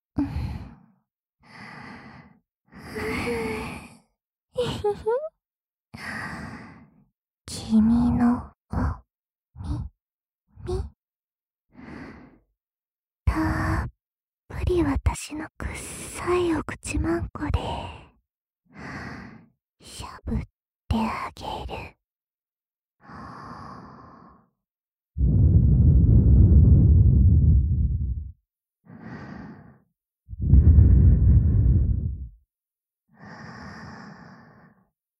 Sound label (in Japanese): くっさい唾液塗れのぐちゅぐちゅ耳舐め♪ ぐちゅぐちゅ耳舐め SE無; ASMR